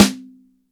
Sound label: MIX SN; DRY STD SN